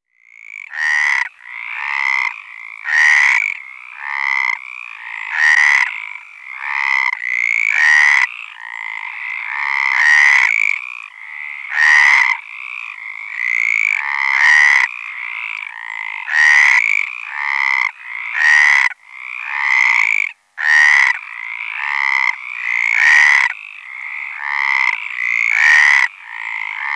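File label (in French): Rainette méridionale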